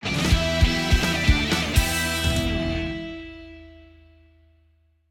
Debrief; BoBoiBoy Galaxy Run; Android

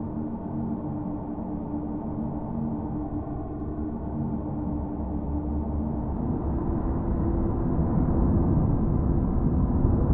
Space Ambiance; unsighted

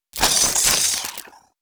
Death; Mars Sortie

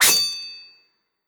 Mantis Blade Imp

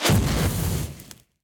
Sphere explosion; Likho

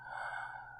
Sleepy breath

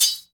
Mines Crawler Hit Top

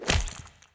Death

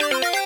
Top Down Rpg Chest Open; Sx Special-Photo Top-Down-Rpg Chest-Open Norm 01

